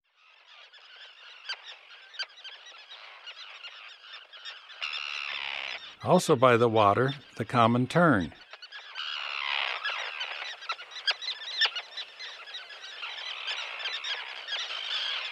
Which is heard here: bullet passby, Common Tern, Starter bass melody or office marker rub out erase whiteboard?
Common Tern